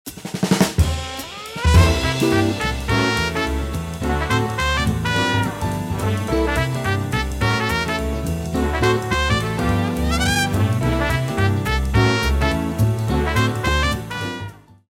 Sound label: jazz